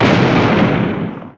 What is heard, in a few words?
EXPLODE; Sonic R; Windows